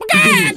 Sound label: chicken cluck scream long